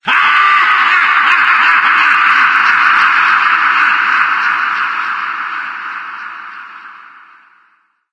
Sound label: boo extreme laugh; Source Engine; docsounds